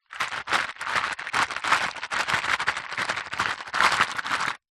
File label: foley stones many in plastic dish shake; zapsplat